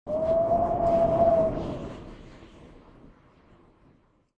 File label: Wind blowing through a window